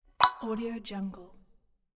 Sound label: scifi button; cook-sfx